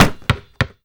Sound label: car tire bounce; Call of Duty Modern Warfare; physics